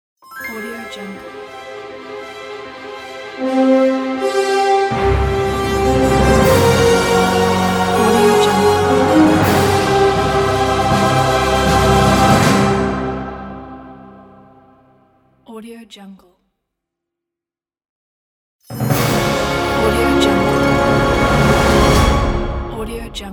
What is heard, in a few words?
orchestra logo pack; orchestra logo pack 10